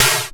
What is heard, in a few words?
A#, Synclav FM PERCUSSION; Big Fish Audio